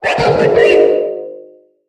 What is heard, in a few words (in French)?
Cri Méga